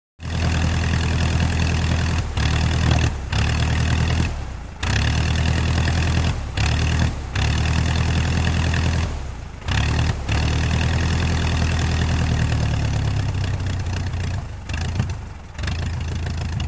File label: Off; D.H. 82 Tiger Moth. Idling With Revs, Cutting Out Intermittently. Engine Stops, Coughs And Sputters, Winds Down. Close Perspective. Prop Plane.